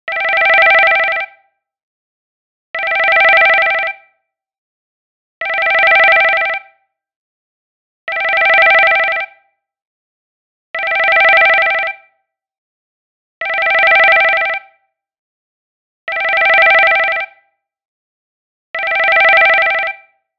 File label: Tring Tring Phone Call